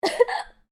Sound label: FEMALE COUGH HICCUP; Female coughing and hiccups; Sick; Human